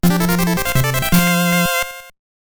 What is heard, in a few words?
Boss Beaten; X-Men Mutant Wars; GB